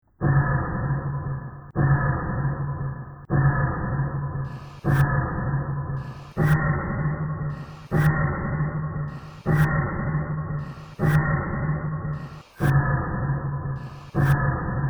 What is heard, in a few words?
splot squelch